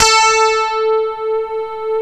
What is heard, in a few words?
ROCK STRAT; E-MU Formula 4000 Series Vol. 3; Analog Odyssey